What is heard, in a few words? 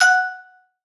receiving public message